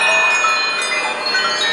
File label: A4 EVOLVE, SPIRIT BELLS; Northstar; The Wizard VOL-1